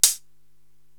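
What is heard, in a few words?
Hi-Hat Closed, Korg TR-Rack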